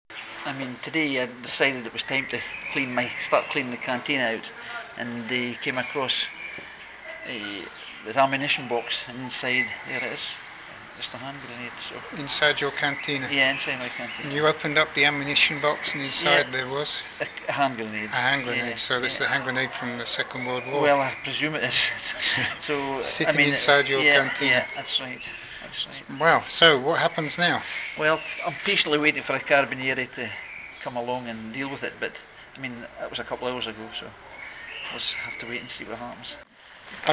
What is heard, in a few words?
hand grenade; barga 2009